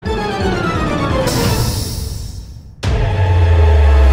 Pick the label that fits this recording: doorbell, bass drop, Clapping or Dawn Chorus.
bass drop